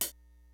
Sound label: LIVE AC KIT, DRUMS; Kid Nepro; Elite